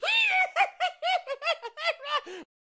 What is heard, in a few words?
Mad Mechanic Attack Vocals